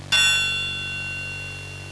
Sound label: LO TRIAN, PERC